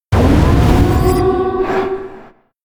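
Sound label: giant wolf leader spawn, monster; Senillinea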